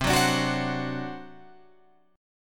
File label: C Suspended 2nd Flat 5th C down Guitar Open F; Csus2b5 strummed